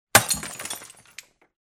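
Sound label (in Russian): бьющаяся бутылка пива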